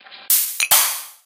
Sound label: syringe gun world reload, weapons; tf